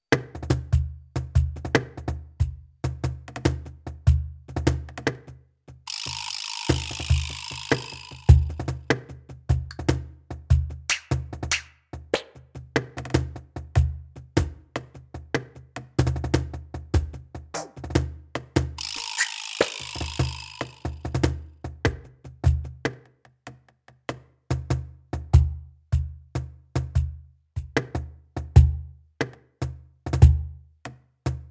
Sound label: ROLAND SPD-20 PRO Kit Cajon